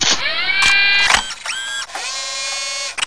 turret deploy; XDM

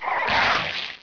zombie alert; XDM

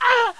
pain; hl1mp; XDM; hassassin

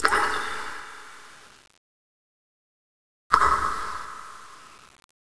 sewer drip; hl1mp; minophor

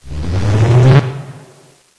power up; XDM; buttons

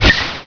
gauss, weapons; XDM

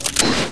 gauss select, weapons; XDM

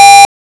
computer alarm; XDM; ambience

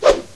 sword miss; XDM

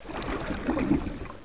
swim; XDM

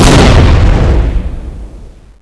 explode; XDM

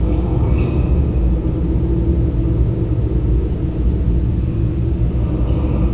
ambience; XDM

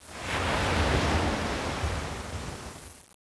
water ocean wave break; hl1mp; dm tropic

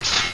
gun reload, weapons; XDM

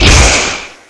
gauss hit, weapons; XDM

